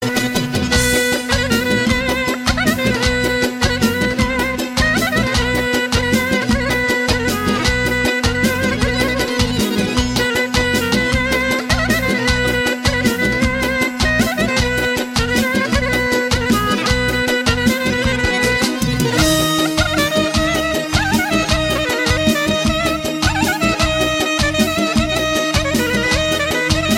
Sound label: Folk